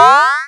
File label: pickup health